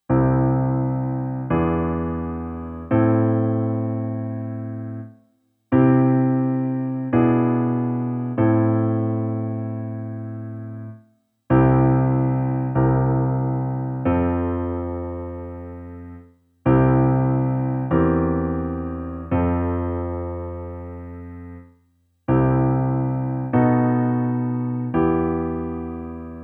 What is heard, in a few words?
Alternate Bass Notes